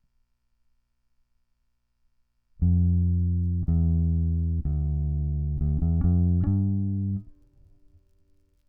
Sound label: bass